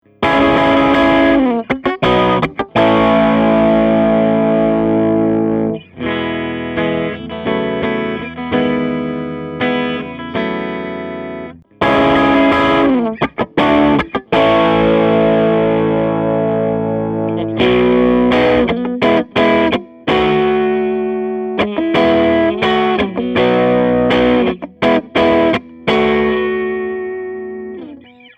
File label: Southern Lady Chorus